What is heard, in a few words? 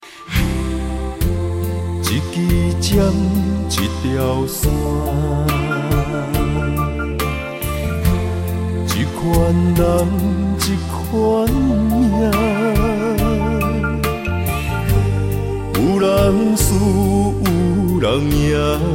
Chinese Song